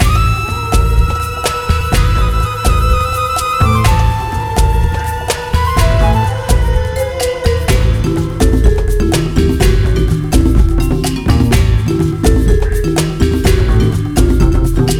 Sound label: cave